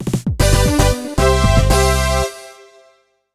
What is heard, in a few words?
Normal Results; Super Monkey Ball 2; Monkey Bowling 2